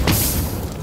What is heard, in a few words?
burned by molotov; counter strike 2